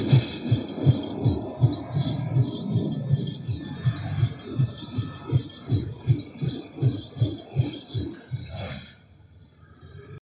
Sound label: chains swing; fortnite; chains of hades